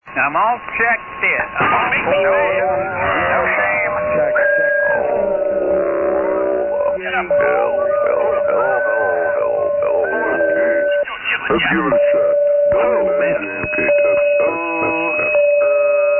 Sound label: SSB Net Tune Up